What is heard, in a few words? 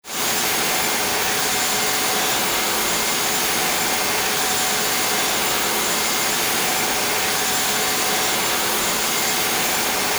boost power